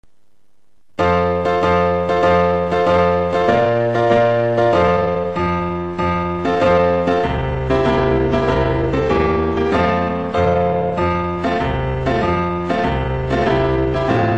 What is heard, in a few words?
bgm